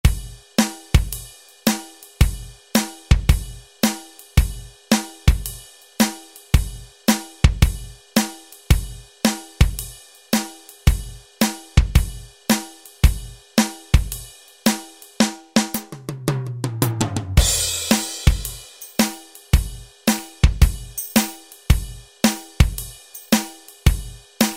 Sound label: Bluesy, Alesis SR-16